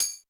U220 FX Set, Drum Machines; Roland U220

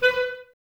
Clarinets, Wood Winds

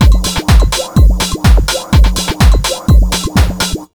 125BEAT, Disco House; USB Soundscan vol.20; Fresh Disco House I; AKAI

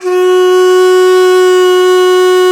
DIZI, Winds

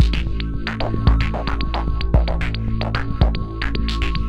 philter (dark orb); Sonic Foundry (Sony Creative Software); Ugly Remnants VOL-2

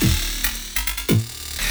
LOOP, 140 BPM, Drum & Bass

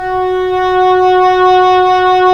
Cor Oboe; Roland - LA Composer vol. 4

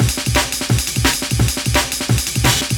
Energy Pitched, Loops, breaks; m8 breaks

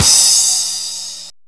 Crash Cymbal original, Wersi Prisma-DX5

